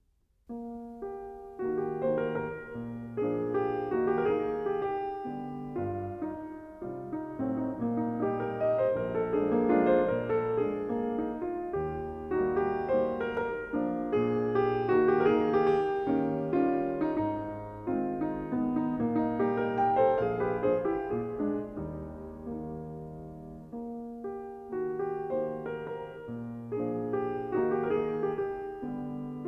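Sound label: Waltz in E flat, piano; Waltz #18; Op. posth.; CHOPIN; Nikita Magaloff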